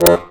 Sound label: DIATON BAS, AKKORDEON, Alpine Volksmusik; Best Service